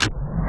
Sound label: law start; Soldat